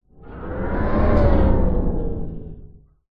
rts vehicle move animation particle swish swoosh accent logo sci fi futuristic